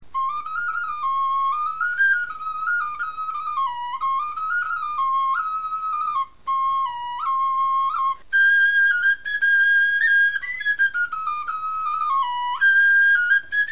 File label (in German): melodie, knochenfloeten